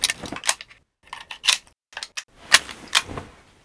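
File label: infantry weapons foley; Red Orchestra Ostfront 41-45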